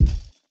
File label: Footstep; Baron